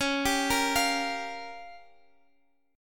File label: Db 6th Flat 5th Db, down; Db6b5; arpeggiated